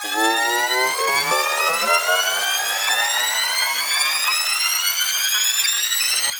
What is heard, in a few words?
Rises 150 BPM